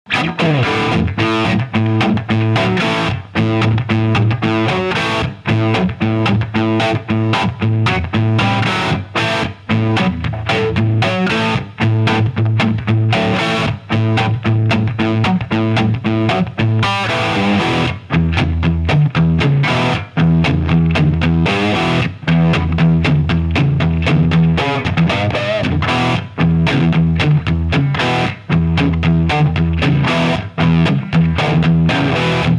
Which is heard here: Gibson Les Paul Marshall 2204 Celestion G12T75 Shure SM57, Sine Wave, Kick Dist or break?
Gibson Les Paul Marshall 2204 Celestion G12T75 Shure SM57